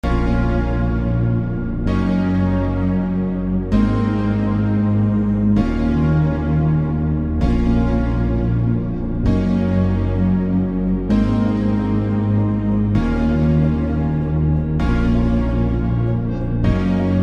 valentines day ringtone, love ringtone, romantic ringtone